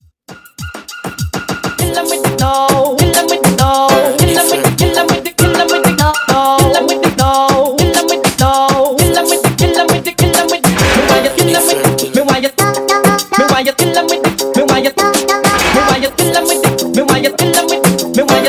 Mash Up Dance hall Re Drum 100 Bpm

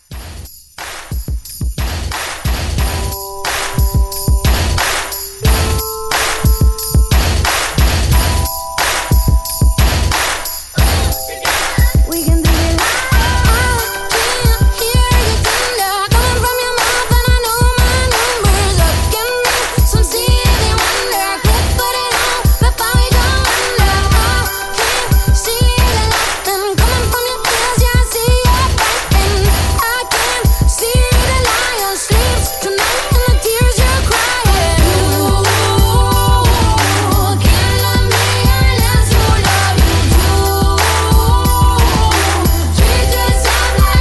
Mixshow Re Drum 90

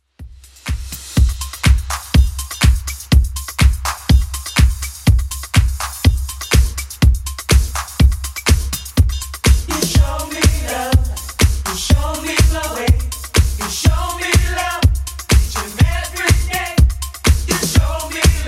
80s Clubmix 123 bpm CLEAN

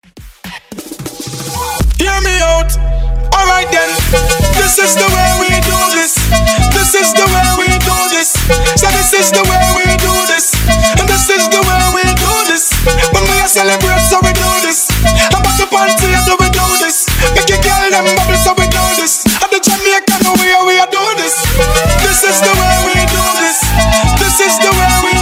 Bass Hall Cut 110 BPM

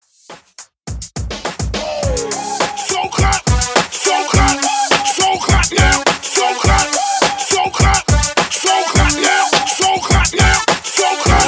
Oldschool Mix Hype 104 BPM

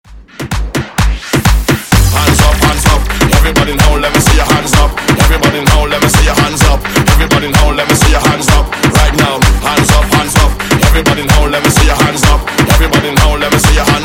EDM Bootleg

